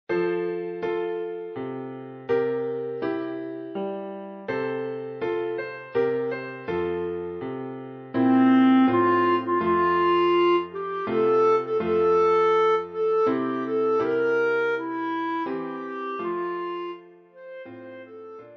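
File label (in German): Bassklarinette; O Tannenbaum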